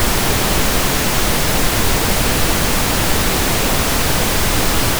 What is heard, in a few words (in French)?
bruit blanc